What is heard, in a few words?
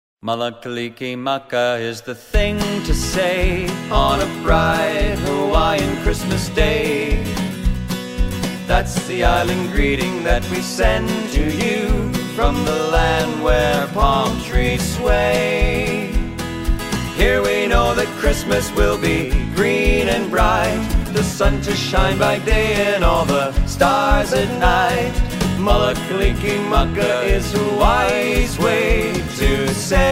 Hawaiian Christmas